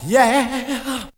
VOC

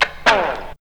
TSCHAK

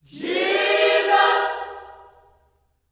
JESUS CHD, Vocal Gospel

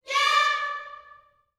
"yeah", gospel vocal, D5; Spectrasonics; Vocal Planet Vol.1 Gospel; akai

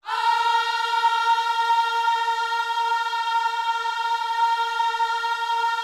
OHS A#4, gospel; Spectrasonics; Vocal Planet Vol.1 Gospel; akai

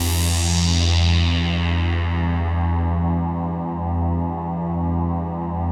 A PAD, ATMO PADS; Best Service; XX Large

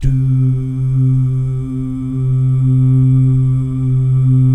"DOO" GUYS, Vocal Jazz Fx

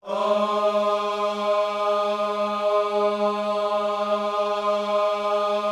OHS G#3, gospel; Spectrasonics; Vocal Planet Vol.1 Gospel; akai

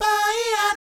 BAYEEAT, Vocal Planet Jazz Fx; Spectrasonics

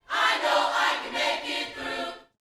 YOUTH CH PHRASE, Vocal Planet Gospel

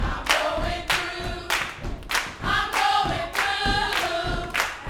Vocal Gospel PHRASE

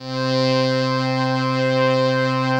Atmo Pads; Best Service; XX Large

